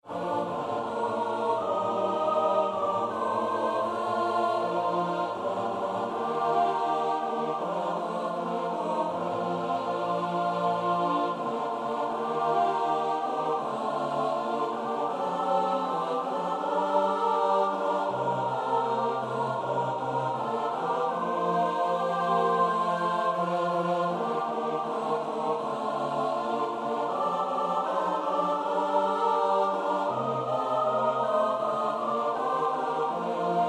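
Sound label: soprano alto tenor bass; Moore (James Edward)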